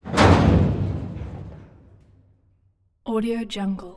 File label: mechanism cargo door open; soundmorph